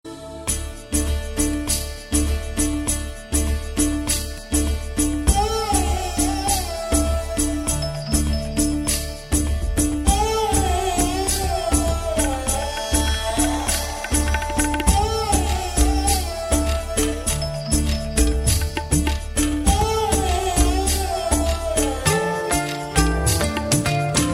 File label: telugu music; Indian